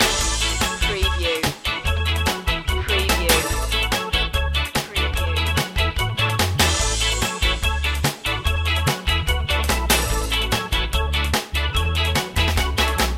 ska park loop

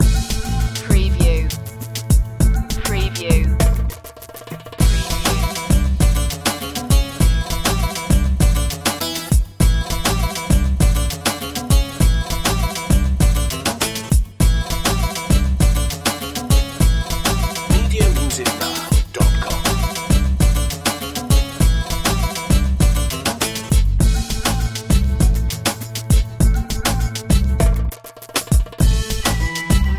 turkish delight

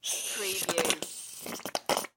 Ketchup Bottle Squirt; Sound Effects Bible

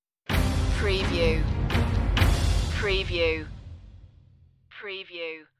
industrial eeriness stinger

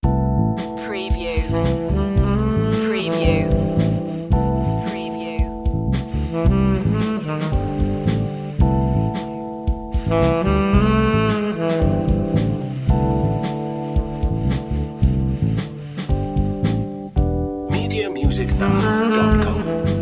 loving sax

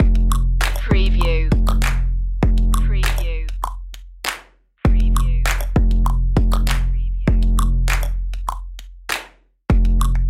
clap hop loop

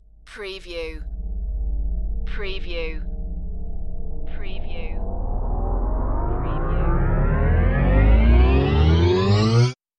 Long Low Rise

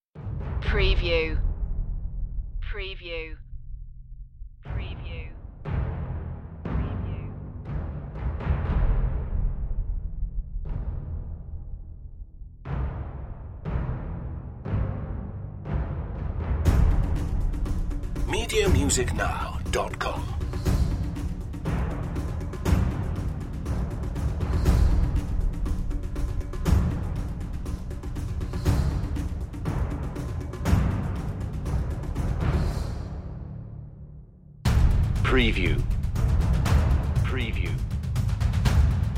perc only

